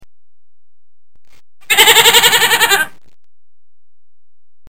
Chevre

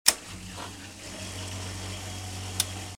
Lave linge

